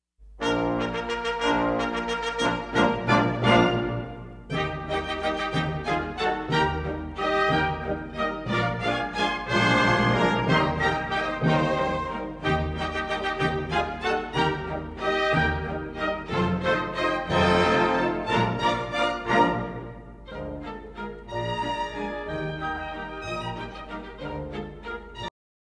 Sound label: Giselle Male Variation Act II